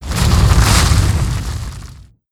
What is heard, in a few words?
Earth Elemental Attack